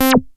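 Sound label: SHORT ACID, BASSES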